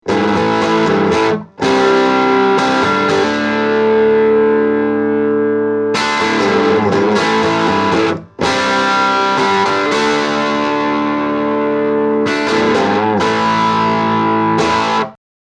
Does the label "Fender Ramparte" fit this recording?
Yes